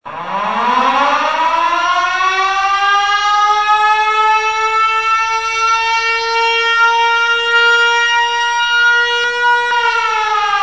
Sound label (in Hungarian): szirena